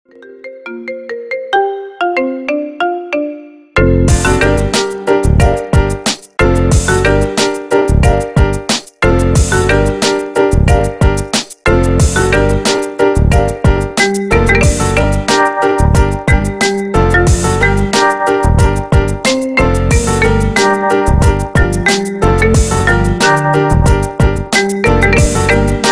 marimba remix ringtone

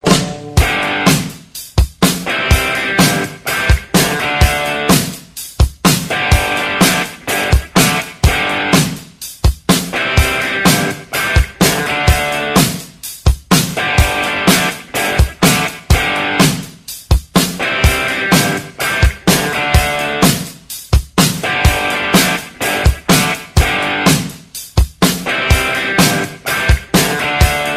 ringtone

